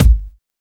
Kick, Drum Hits

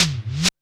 REV TOM; Back In Time Records; Big Bang; ST.REV.TOMS

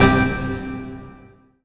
GREEK HIT C3; Best Service Dream Experience

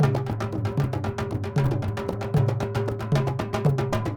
INDIA L; Spectrasonics - Heart of Asia CD2

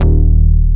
fat bass; Masterbits; Dance Trax

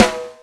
SNR TIMBALE; E-MU Formula 4000 Series Vol. 5; Protozoa; Orbit Presets

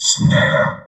vox, electro vox; Sound & Vision; Gigapack II CD 2 (Roland)

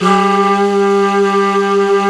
Flute, Singin' Flute

